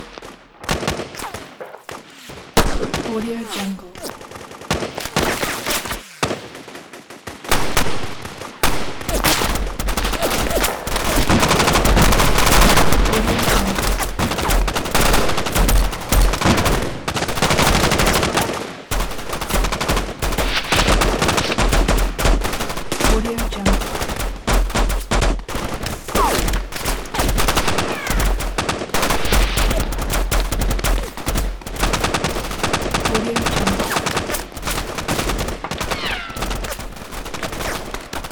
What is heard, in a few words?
gun battle moderately busy weapon fighting